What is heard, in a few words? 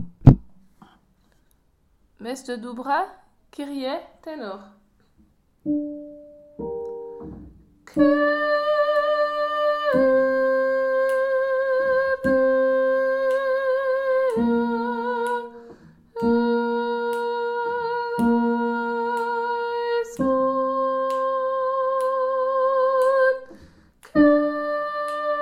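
kyrie ténor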